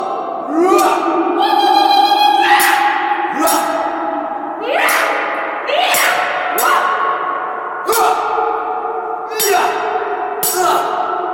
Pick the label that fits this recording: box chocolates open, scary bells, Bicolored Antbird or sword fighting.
sword fighting